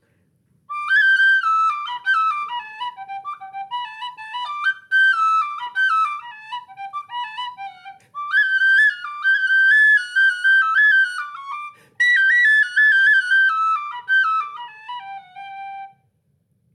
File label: jig, whistles